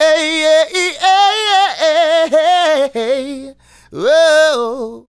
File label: Male Solo, Vocal; Sonic Foundry (Sony Creative Software); Vocal Toolbox